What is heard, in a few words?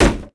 wood hit